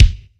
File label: airy bass drum single shot F# key